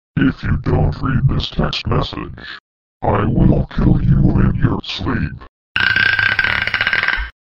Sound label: Scary Text Message Ringtone